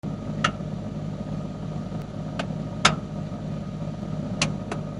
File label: popping cracking, refrigerator; Samsung